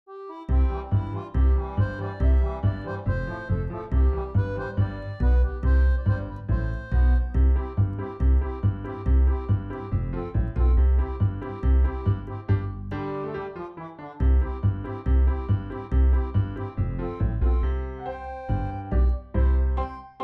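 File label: recorder karaoke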